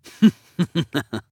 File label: Assassin Laugh; Realm Royale; Anarchist